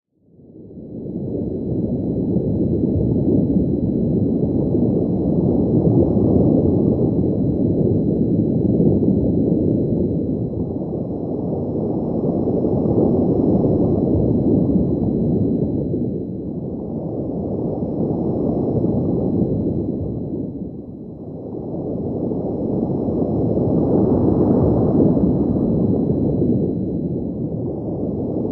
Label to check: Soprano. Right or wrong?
Wrong